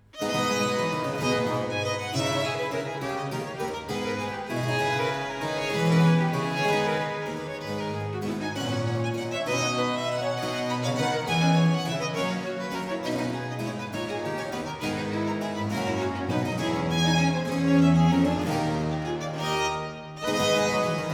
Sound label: Courante; German Chamber Music before Bach